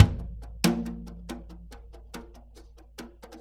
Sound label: Earth Loop, Drum Tools, Laid Back